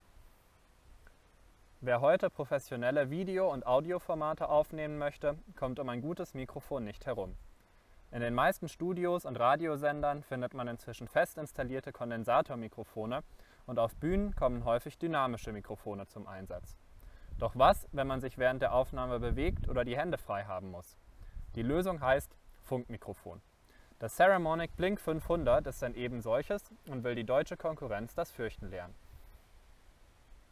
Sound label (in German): Wind unter Baum Ohne; saramonic blink 500 b2w